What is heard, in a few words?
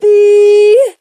eee, voice; ooh ahh